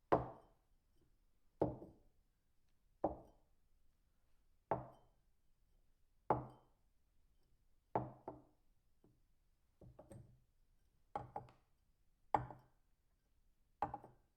full glass on wood table

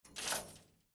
shattered glass hitting metal

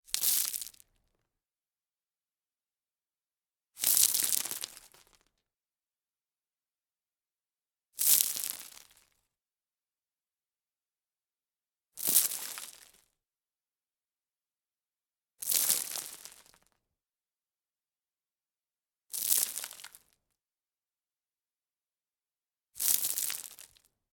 dumping gravel

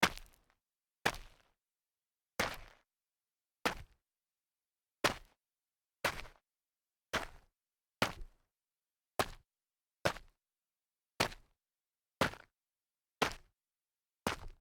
sneaker small solid jumps grit, foley

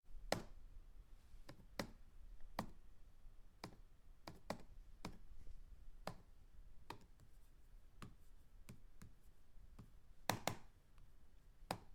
push computer keys

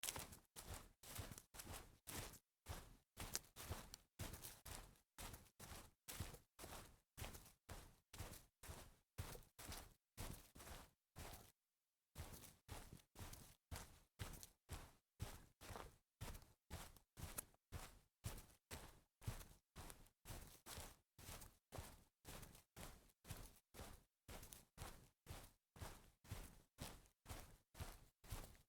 walking fast on grass

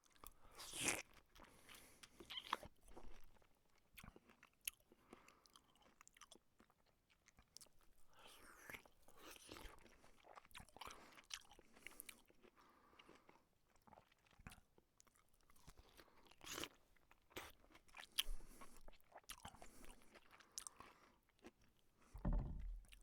eating and slurping

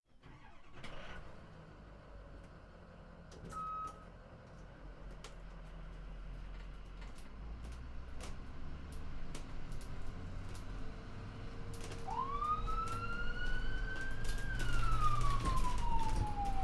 ambulance drive siren

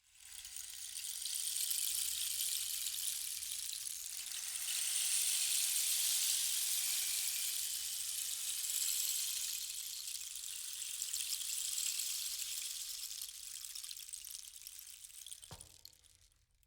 rainstick slow

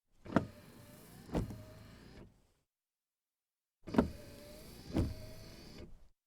suv windshield wiper

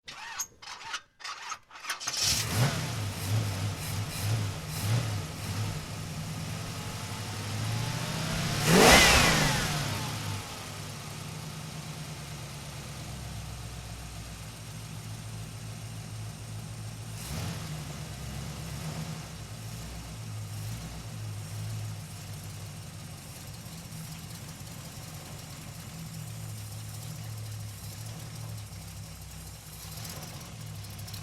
muscle car start rev and idle

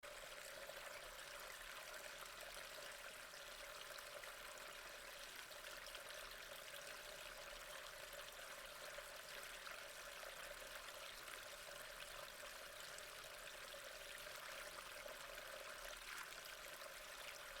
storm drain

